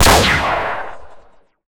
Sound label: stylized gun shot sound, firearm